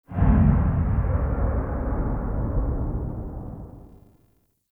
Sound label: Big Hit; Ambience; Warframe; Nokko Colony